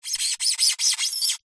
vibrations cello bow styrofoam cooler short; sound spark

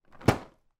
food cereal box malted wheats put down on table

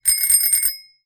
transport bicycle bell ring; zapsplat

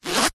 wallet coin compartment zipper fast zip; foley; zapsplat

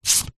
household cd disc pick up from hard surface

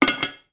household teapot lid on

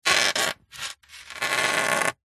foley polystyrene sheet flat thin scrape creak

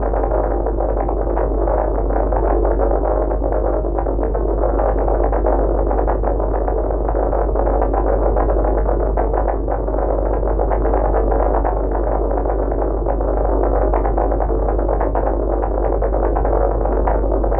Digital Crusher Loop; martyn stonehouse

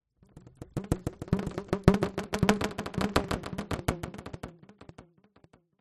sound design rubber band twangs reversed reverb

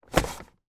car sun shade down

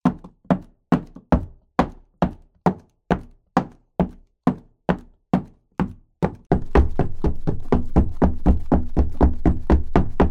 footsteps; zapsplat

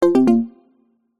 notification digital

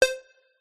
multimedia game sound synth digital tone beep

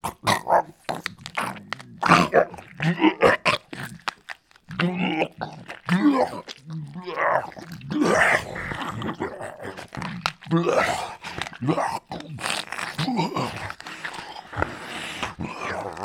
horror monster zombie male eating body; sound effects one

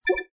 multimedia game science fiction beep generic tone

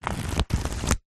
household book pages flick fast; zapsplat

